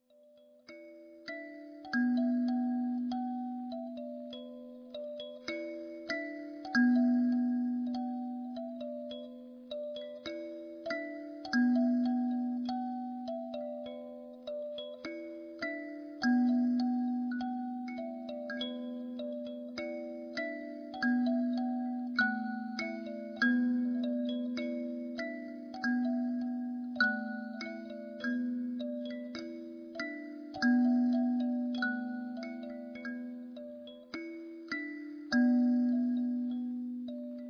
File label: Kalimba Raindrops